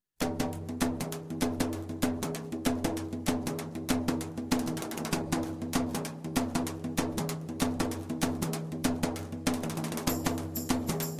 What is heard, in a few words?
drum beats